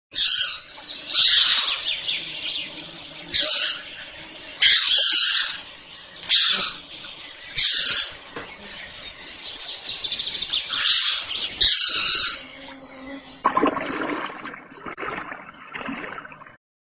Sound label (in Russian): zvuki vyhuholi